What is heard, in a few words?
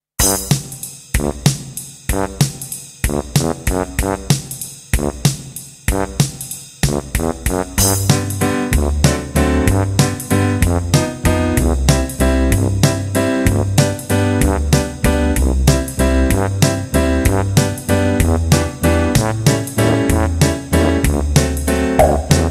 Instrumental